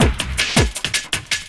Loop, Drum & Bass, 160 BPM

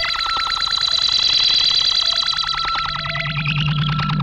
ZAP GUN, Funk Guitar; 90 s Sample CDs; Zero G

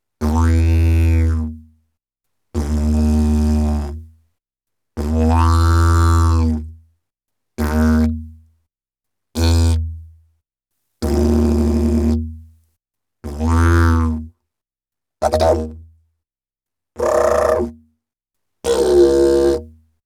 Didgeridoo And Overtone; Good Stuff Audio

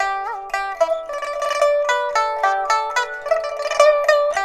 PIPA, 90; INIS - Opium

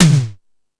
Fusion Stix, Drum Machines

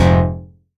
stab F, Noise; stabs

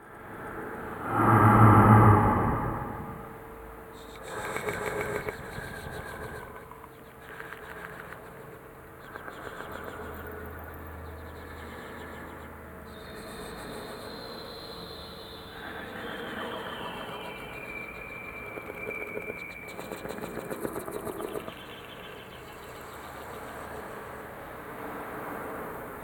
Whispers; Zero-G - Ghost In The Machine

